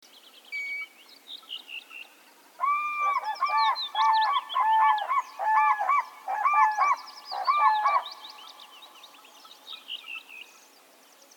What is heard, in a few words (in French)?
grue cendree